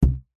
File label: rubber band large ping twang thud; foley; zapsplat